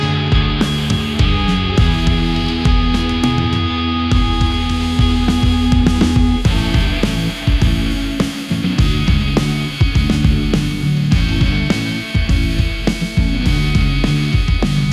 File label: viking battle anthem rock